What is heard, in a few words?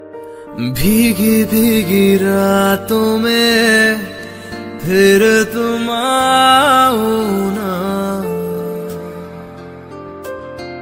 New Romantic Hindi Song Ringtone